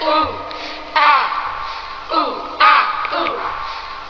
ooh ah ooh ah ooh, Voice; ooh ahh

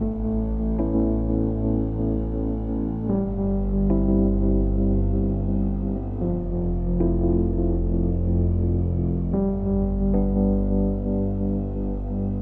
keys, Loops, Sad

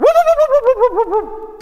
woo woo woo woo woo woo woo woo; ooh ahh

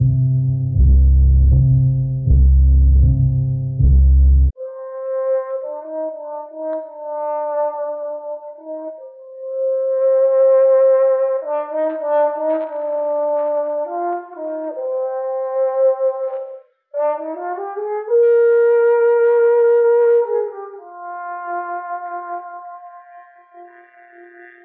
horns, Sad

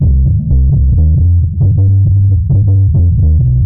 bass garbled mess, Loops Phonky

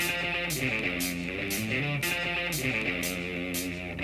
guitar; Loops; Phonky

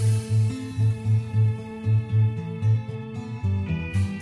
strings, Sad

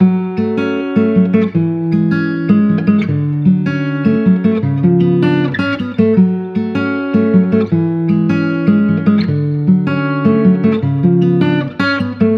guitar, Jazzmatazz Loops

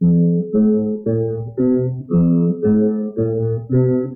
bass piano funk, Loops, Sad

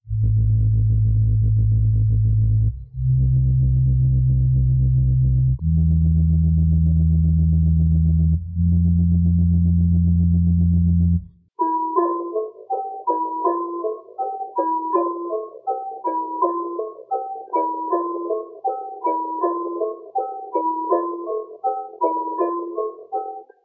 keys breath, sad loops

